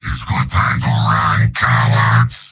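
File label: yell, robot; heavy; mvm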